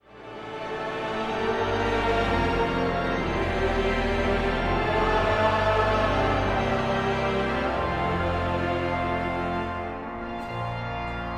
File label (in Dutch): Kerstsamenzang; Lofzang Zacharias